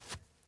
dirt jump

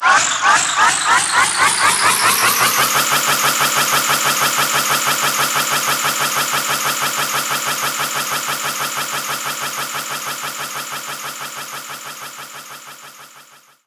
Multiplayer SFX - Crab Tank

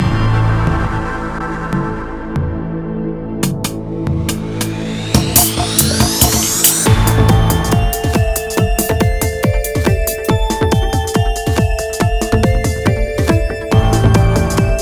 City; The Next Penelope

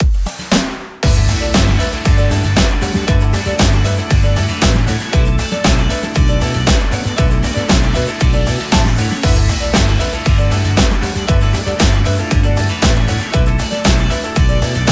Music Menu event

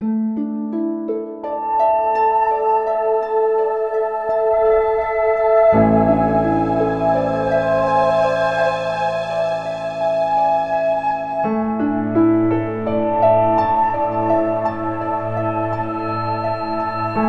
Forest Cave Intro; Eternal Radiance

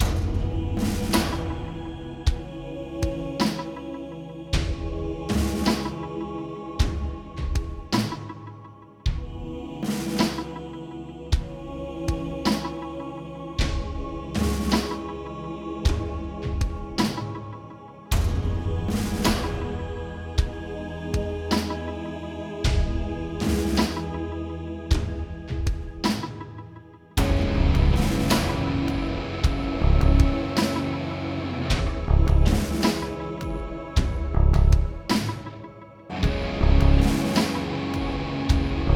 Cemetery Loop

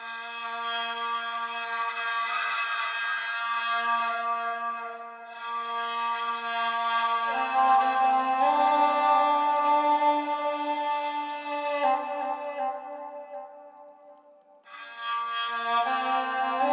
Sarangi solo; raji an ancient epic

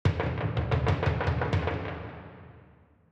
Ouen Brassband; ebaseball powerful pro yakyuu 2020 ps4 switch gamerip 2020

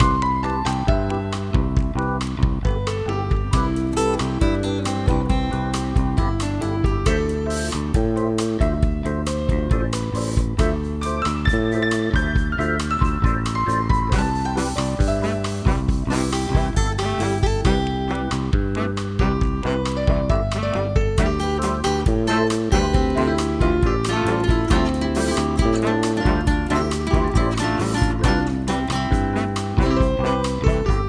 bossanova loop; Summer Catchers

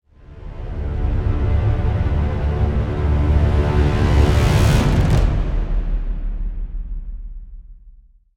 Stinger Main Theme; Global Conversation 34

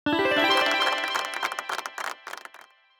Jingle Result; Splatoon

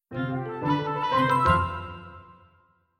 sting school level up; The Sims 3; windows gamerip 2009

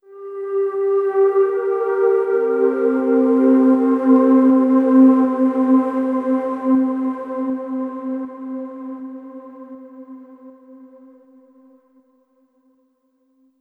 Overlay Tone; Act3 Downtown; Mirror's Edge Catalyst

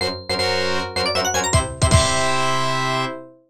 Fanfare; Star Luster; X68000

